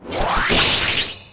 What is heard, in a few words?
circle directions elements, effect; soul ascetic